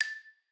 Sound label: xylo